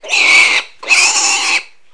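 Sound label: pig pain; REDNECK; RAMPAGER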